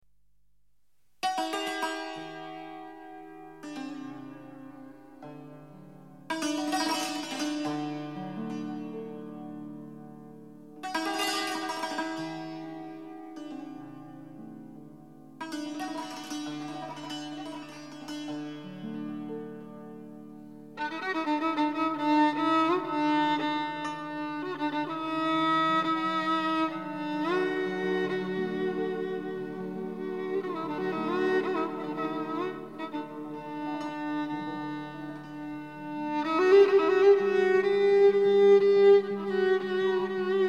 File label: santour; Shooshtari